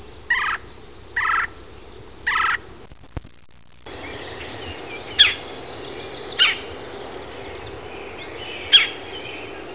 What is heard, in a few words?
red-bellied woodpecker